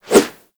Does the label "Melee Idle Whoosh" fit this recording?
Yes